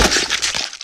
blood splatter

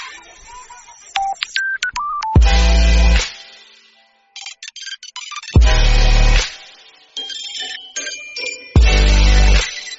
hot milk

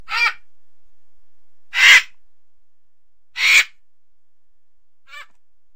vultures sound

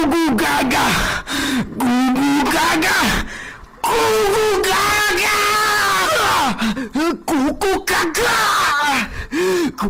gugugaga loudest; sukuna

